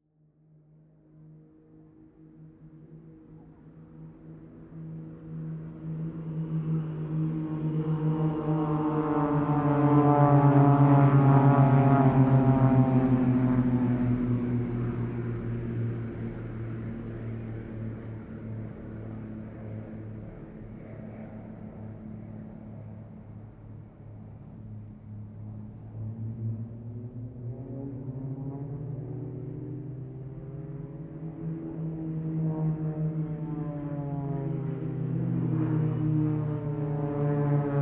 aircraft extra flugzeugbau ea300 x4 airshow acrobatics close by